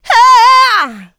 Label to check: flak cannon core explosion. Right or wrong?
Wrong